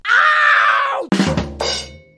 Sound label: scream and drumoll; bop it original